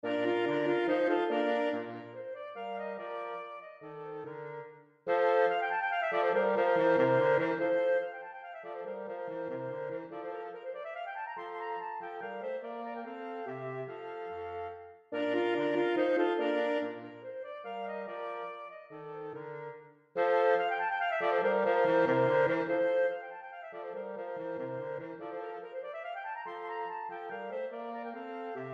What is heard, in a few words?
Divertimento in Bflat Menuetto Moderato; Mozart